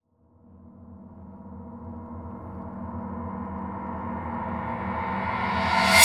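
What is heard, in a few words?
Reverse Single Hit Single String; Cinematic Metal Impacts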